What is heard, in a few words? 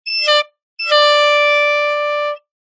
sport air horn 2x blasts; zapsplat